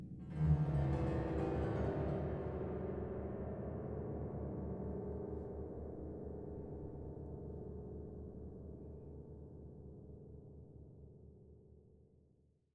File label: cave, ambient; minecraft; SMCNV-pack